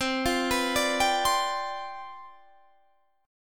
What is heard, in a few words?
C Major 7th Suspended 2nd Suspended 4th C down, chord